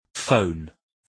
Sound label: phone